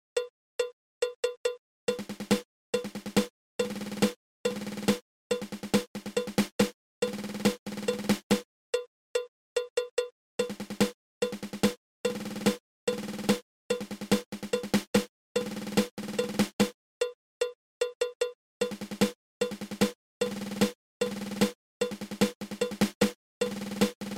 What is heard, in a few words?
Nine Stroke Roll